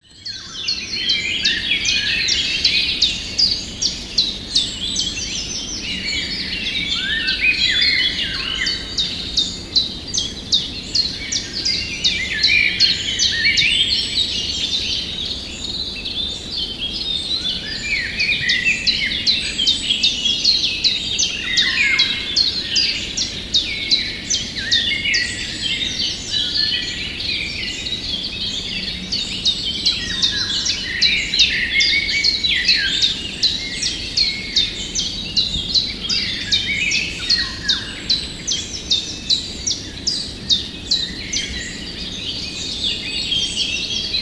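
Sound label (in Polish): pierwiosnek, pierwiosnek śpiewa nieco dalej od mikrofonu. Oprócz pierwiosnka słychać ziębę, kapturkę i wilgę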